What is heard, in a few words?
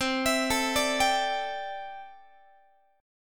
C 6th Add 9th, C, down, Guitar; arpeggiated; JGuitar